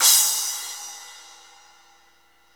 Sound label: CYM X12, Naturals; Club-50 - Foundations Roland; Natural 10